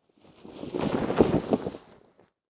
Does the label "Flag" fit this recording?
Yes